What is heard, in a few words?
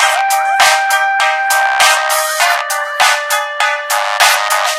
Love Flute; android default